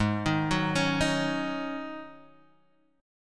G sharp 7th Suspended 4th G sharp, Guitar Half Step Down